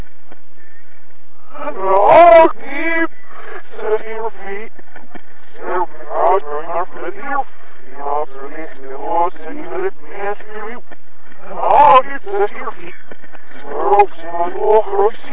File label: garbled background; century ghost; winchester